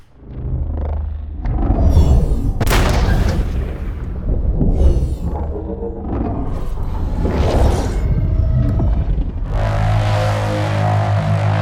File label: sound effects montage